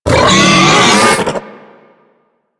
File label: Infested Juggernaut Vocal Attack; Warframe